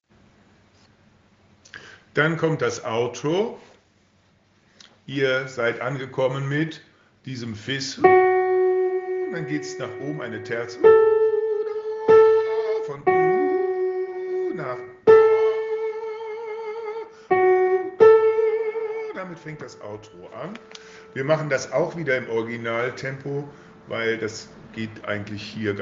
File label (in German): Engel Alt originaltempo; Uebedateien